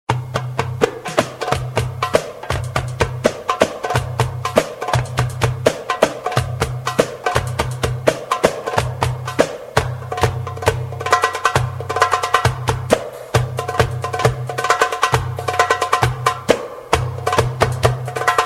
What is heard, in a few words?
Derbouka Solo